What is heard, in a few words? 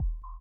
SONAR; Spectrasonics; Metamorphosis